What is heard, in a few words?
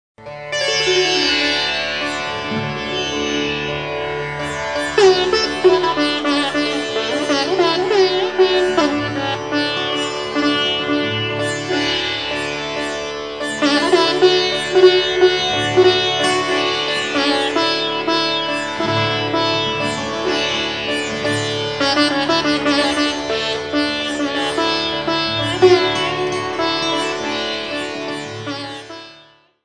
hansadhuani alaap; Raga Hansadhuani - Alaap